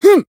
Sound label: Voice attack; shigure